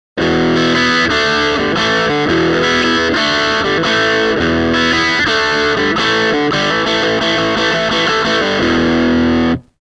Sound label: crunch